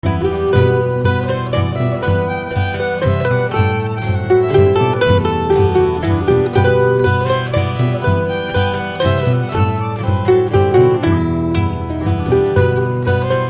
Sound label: mazurka